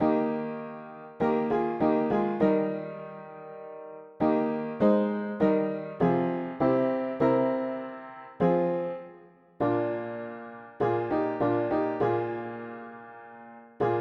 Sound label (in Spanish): mono piano; hymns; Himnario pequeno